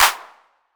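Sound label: 808 clap; PERC TR; Wizoo - Platinum 24 Electronic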